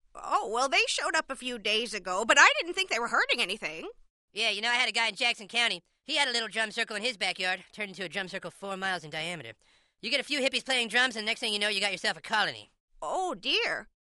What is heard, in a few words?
Hippie drum circle